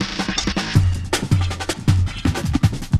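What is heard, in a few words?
drums and bass, Loops; Distored Reality 1&2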